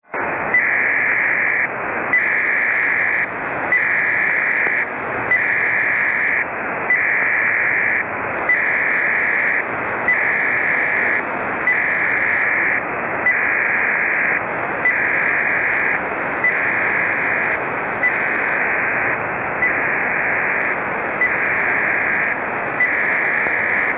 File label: Pactor 3 Shore Station sending lots of data to Ship; winlink